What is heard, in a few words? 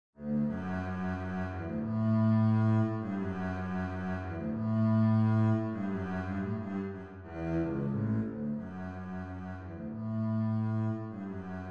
spring bass melody; fourseasons spring